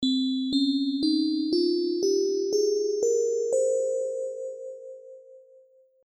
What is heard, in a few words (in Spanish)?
Do Frigio